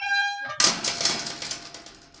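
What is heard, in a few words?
gate close; Mafie 1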